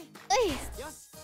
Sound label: hoi